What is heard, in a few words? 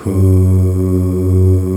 POP OOHS